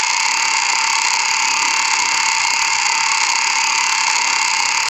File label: minigun spinning